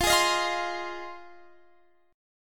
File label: F Minor 7th F Guitar Drop D